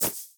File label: Generic Pickup Auto; Objects; Pickups